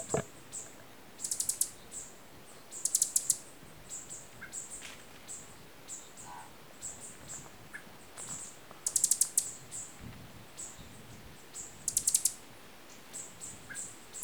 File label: Green Honeycreeper